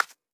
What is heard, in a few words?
Pigeons